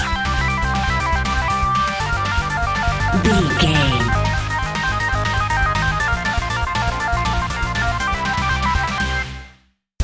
intense challenge video game

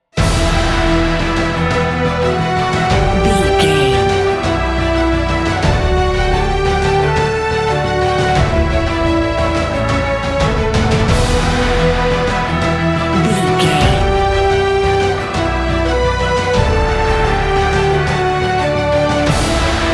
epic cinematic

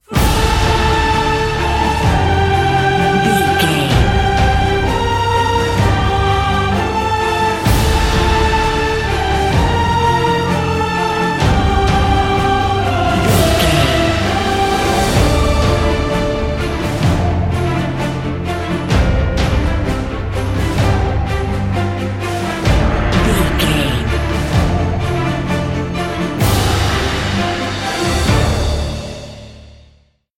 awakened epic cinematic

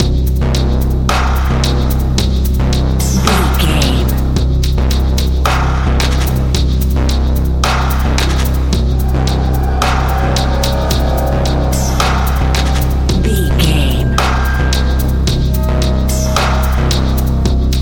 ominous scary action adventure

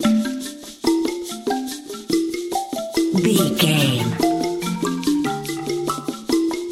rainforest expedition loop

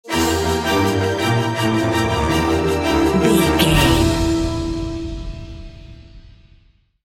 undetected spy stinger

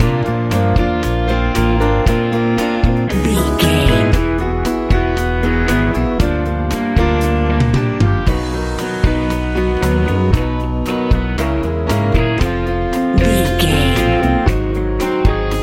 amen rock

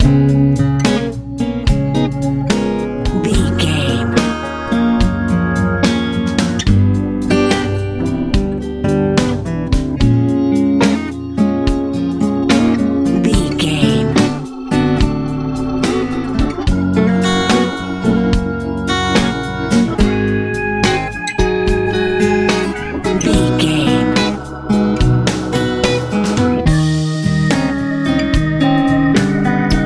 good sunny blues

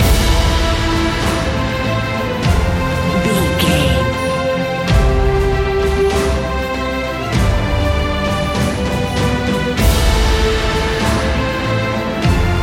cinematic corporate loop

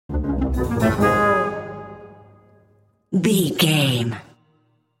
cartoon

